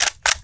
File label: assault gun reload; ragemap2025; fournines